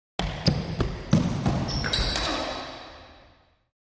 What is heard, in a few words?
Racquetball; Body Fall With Shoe Movement And Short Vocal Effect